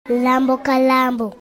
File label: lambo kalambo